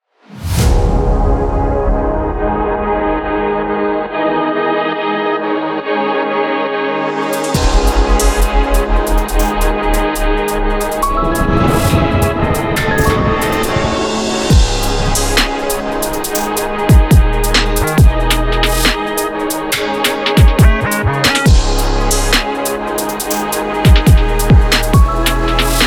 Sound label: Drill Drums; MIDI; Digit Sounds